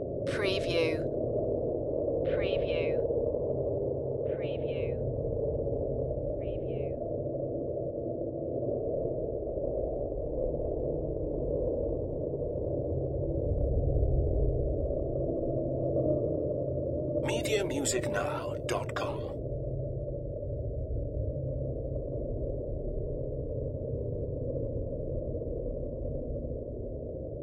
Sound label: abstract wind background loop